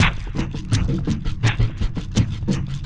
SLOW WATER GROOVE; Sonic Foundry (Sony Creative Software); Vir Unis Infusion

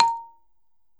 BALAFON

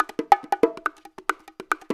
BONGO LP.140

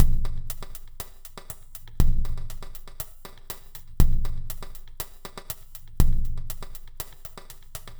Minimalism, Processed Percussion

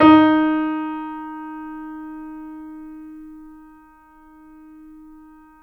D#, The Piano; InVision Interactive - The Piano

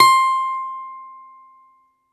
BALALAIKA, Russian Strings

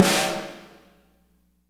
SNARES; Spectrasonics; Burning Grooves

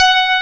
FM G3, ELECTRIC PF, Piano; AKAI S6000 CD-ROM - Volume 1

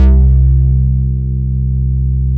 ANALO BAS, ANAL BASS

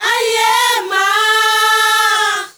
ZULU CHR, FEM. CHOIR; Spectrasonics; Heart of Africa 2

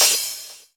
CRASH, Dance Mega Drums; Best Service

